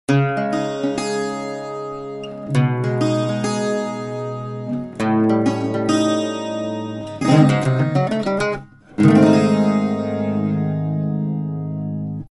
LAUD STEEL

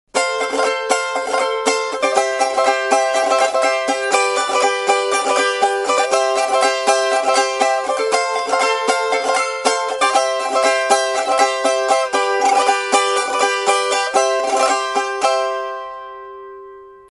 CAVAQUINHO; PORTO

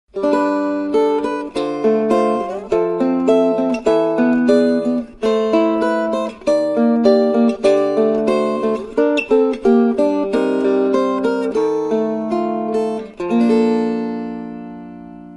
LUTE

